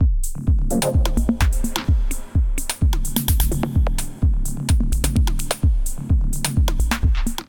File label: Pads Dub Techno Kit groove 128 bpm, Drum; MIDI Clips; 111 - 130 bpm; 64 Pads Dub Techno Kit groove; Ableton; Factory Packs; Drum Essentials